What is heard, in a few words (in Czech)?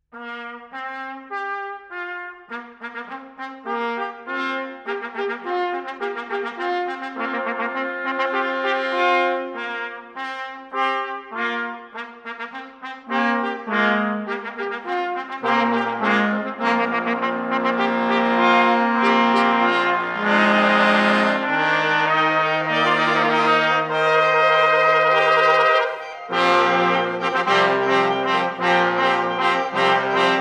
fanfara